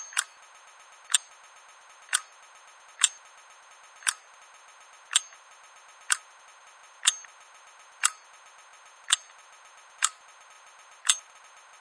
tick; Jungle-War

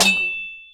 sword; Knights-Diamond

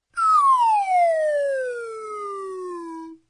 down; Monkey Banana Jump

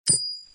coin; Jungle-War

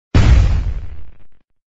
explosion; frog super bubbles

